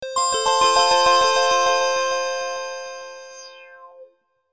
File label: Alarm; Yezz; Andy-A5; alarms